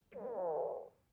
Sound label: ui low food